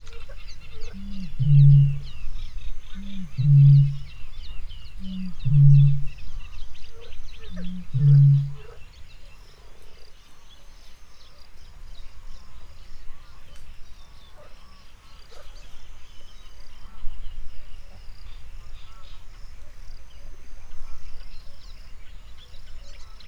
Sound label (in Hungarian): négyszer, bölömbika; hortobágyi nemzeti park 2013